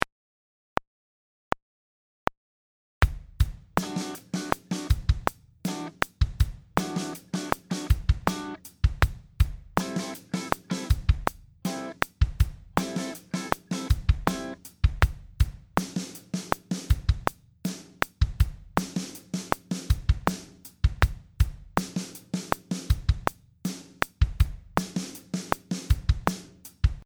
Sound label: groove r&b